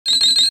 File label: Notifier Cricket; BlackBerry OS-4.6